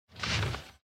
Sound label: click; lantern